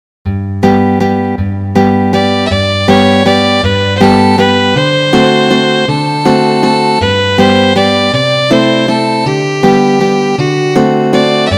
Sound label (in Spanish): duos canto guitarra; carulli ferdinando carulli op293